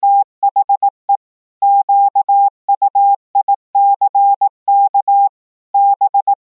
Ring Tones; N8

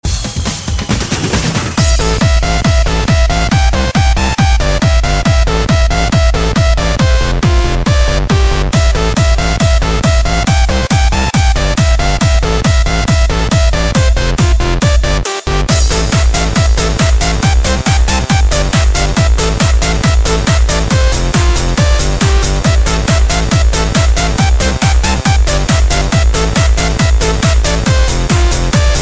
Atomic Disco Rocker

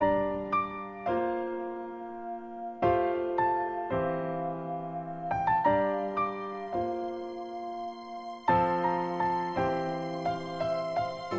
Peaceful Tune

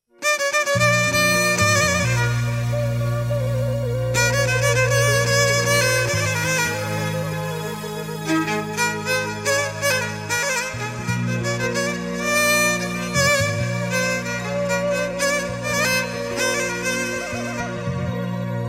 Amazigh Atlas

